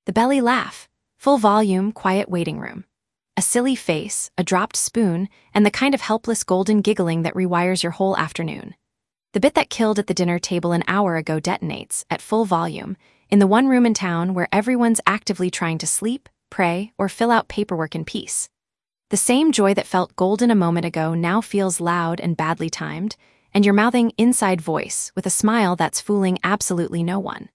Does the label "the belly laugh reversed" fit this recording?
Yes